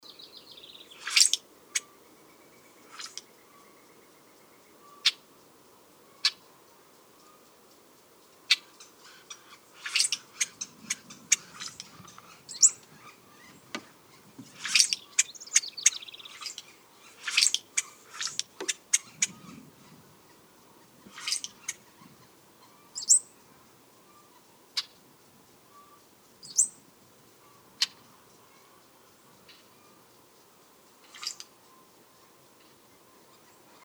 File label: Brewer's Blackbird, Euphagus cyanocephalus; blackbirds orioles